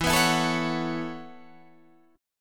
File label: B 7th Suspended 2nd Suspended 4th, chord